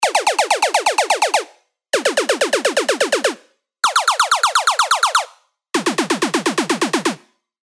FX Laser Tag; bass tech house; revealed serum 2 bass tech house vol 1

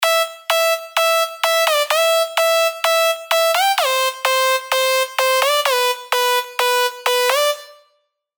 LD, dirty dutch leads; sylenth1; Hardgaan; revealed sylenth1 dirty dutch leads vol 1

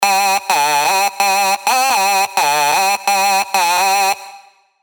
All Aboard, Sylenth1 dirty dutch leads; revealed sylenth1 dirty dutch leads vol 1

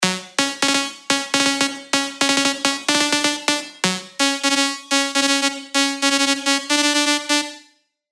LD Carrier; revealed serum 2 bass tech house vol 1

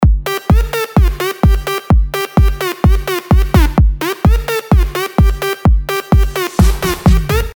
LD dressed, dirty dutch leads; sylenth1; We're So Back; revealed sylenth1 dirty dutch leads vol 1

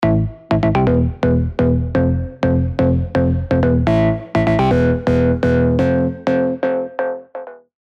serum 2 house stabs; Chicago 2 Miami; alonso saloon serum 2 house stabs

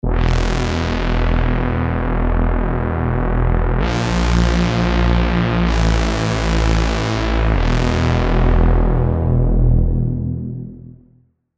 BS Reese; Diva; alonso myon diva soundset vol 1

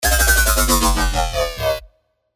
Hyperdrive; fx; revealed serum fx vol 2

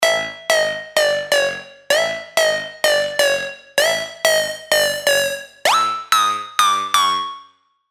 LD, sylenth1 dirty dutch leads; Hitmaker; revealed sylenth1 dirty dutch leads vol 1

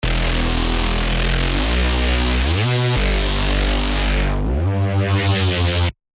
Emergency Contact High, Serum 2 hard bass; revealed serum 2 hard bass meltdown

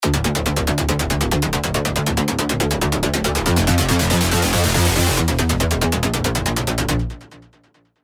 rolling bass; Uplift Powah; Revealed Serum 2 Rolling Bass Vol. 2

